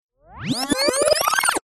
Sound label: musical music rewind